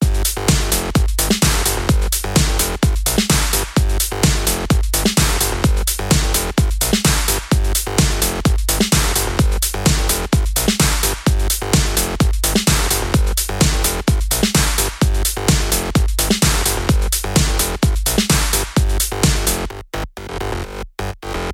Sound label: electro beats; DJ Booth; effects